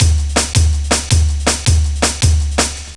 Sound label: Porta 162, breaks; minihalfs